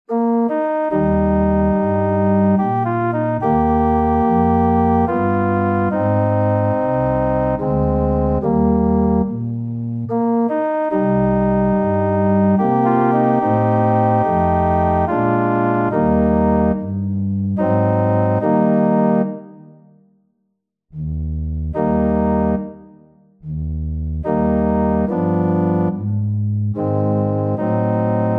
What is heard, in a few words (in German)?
Amazing Grace (Querfloete Solo + Orgel-Playback)